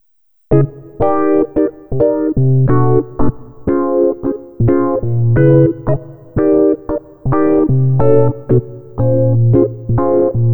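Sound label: EP, electric piano, electro lounge; Sonic Foundry (Sony Creative Software); 090 Below Bangkok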